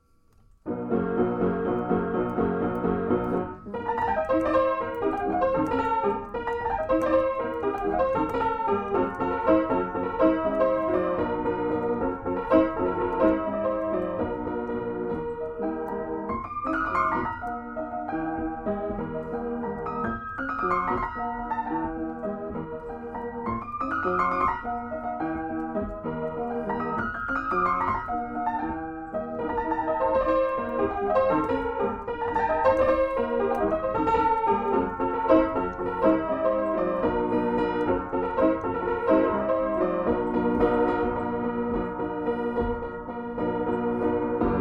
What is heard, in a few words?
mazurka; Chopin Mazurka Op 24 No. 2; Piano Recordings